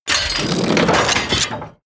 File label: robot transformation metal